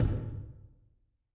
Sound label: hull, Footsteps